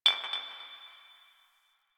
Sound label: Garbage Glass Drop; Ambience; Warframe; NineteenNinetyNine